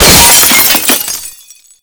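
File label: glass window break medium; Explosions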